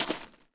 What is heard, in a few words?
gravel; firefight